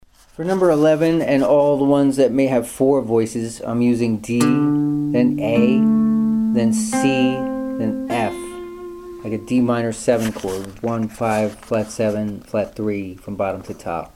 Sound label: mozambique patterns